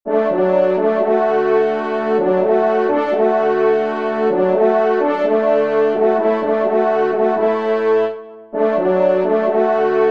trompe

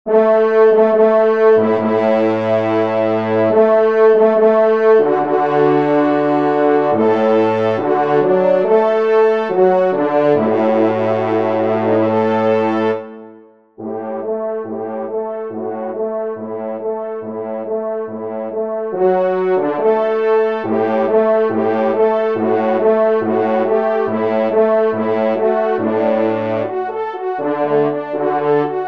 TRP; Marly; Victor Viney

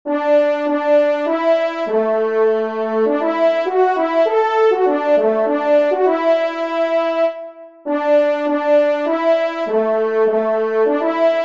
TRP; Leve Dieu; Messe d'Estival

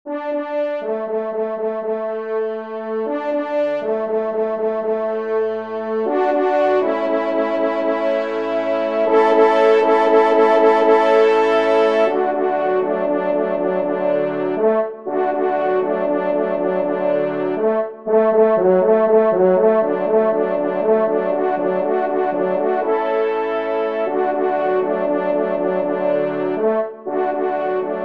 Air Varie, trompe

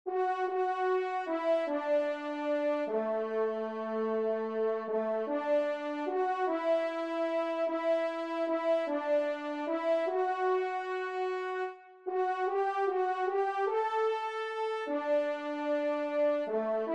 musique paroles, trompe; 3e Trompe

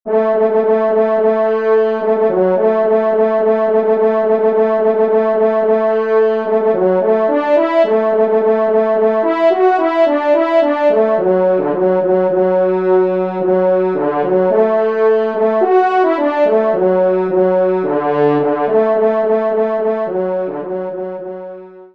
pas redoublé, trompe; Loisirs du Chasseur 6

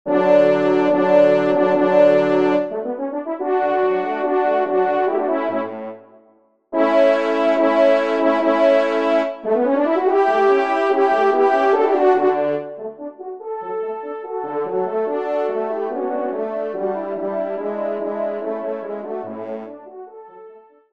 Trio Cors

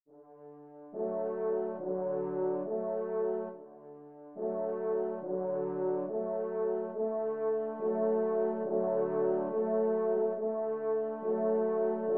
Priere et Carillon, trompe; Fernand Andrieu; Trompes et/ou Cors en Ré

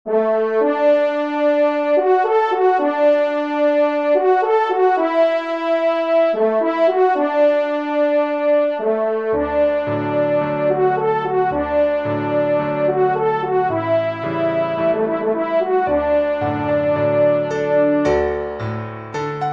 Souvenirs Alpes Suisses Trompe et Piano; Divertissement pour Trompes ou Cors; Auteur : Hervé Teknia

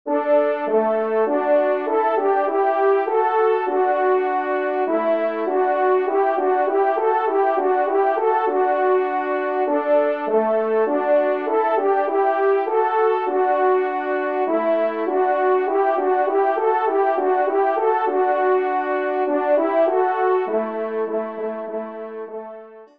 Cotillon Do Maj TRP; 2e Cotillon; Corrette

